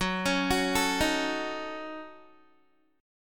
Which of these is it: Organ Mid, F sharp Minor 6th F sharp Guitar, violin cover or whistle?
F sharp Minor 6th F sharp Guitar